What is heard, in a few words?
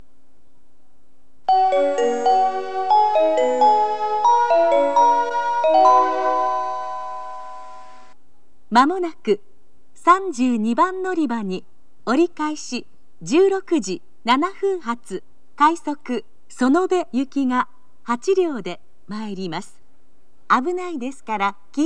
turn rapid sonobe, kyoto, station